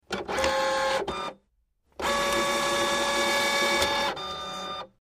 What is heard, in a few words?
Computer Printer Ink; fax scanner copier